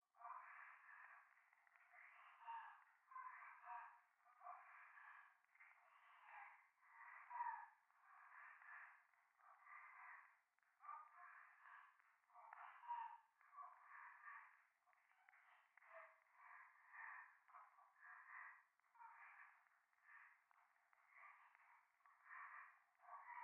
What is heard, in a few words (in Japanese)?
右耳 密着 処女 喘ぎ 暖炉; 夢の中